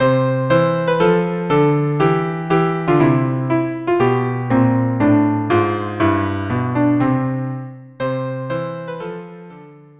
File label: viola cello duo; country garden